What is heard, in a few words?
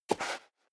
step high heels sand single left; schritte